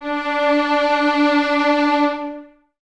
Violins Detache D 4; Miroslav Vitous; 11 Violins

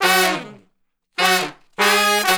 Horn Section

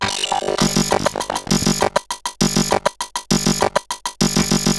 Bent RX17 (100BPM), cyborg beats; musicradar

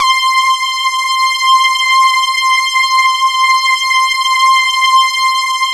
JV-1080

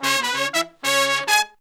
96BPM, Horns

